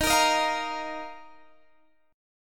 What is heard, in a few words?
Eb Suspended 2nd; Ebsus2; strummed